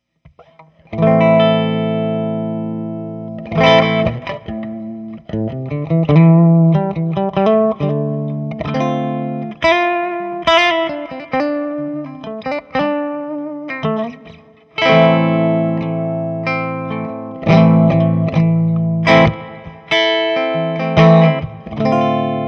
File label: tele bridge clean